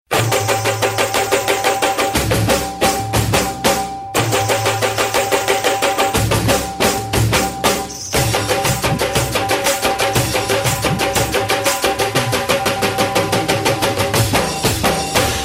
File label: Desi Beats